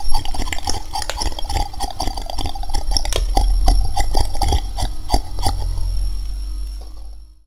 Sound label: Sticky Slurp; Zero-G; Soundclash Nu Dance Electro; Acidized Wavs; FX Atmospheres